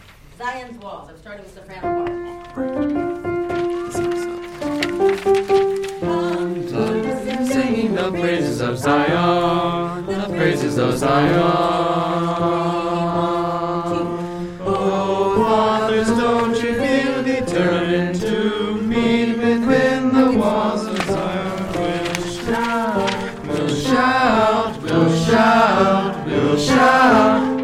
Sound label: BASS